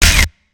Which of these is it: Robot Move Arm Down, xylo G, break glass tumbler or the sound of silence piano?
Robot Move Arm Down